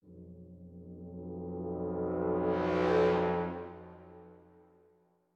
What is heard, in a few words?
Tension Scout Mode Stinger; sniper ghost warrior 3 gamerip 2017